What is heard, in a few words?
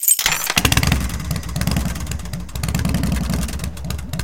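worn old little motor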